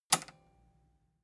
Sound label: metal button robust spring release